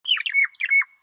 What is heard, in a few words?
Silver Fledgling Attack